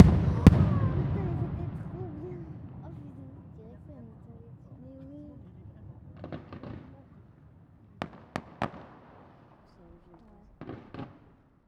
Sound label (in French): Explosion Artifice court